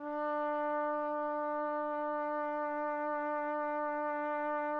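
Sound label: TPT, trumpet; Roland - LA Composer vol. 3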